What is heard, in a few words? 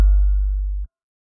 sonar Tail Suit Close Beacon; Barotrauma; Real Sonar